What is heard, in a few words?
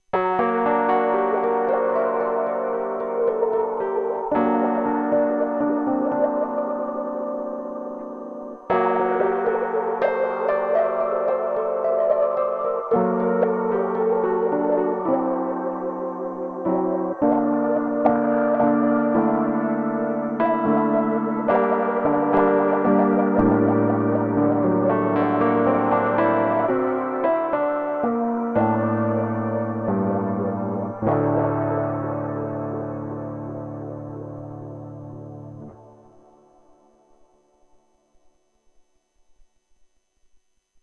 MOD Devices MOD DUO X Piano Tram Quack Shiroverb Mk2 Dreamy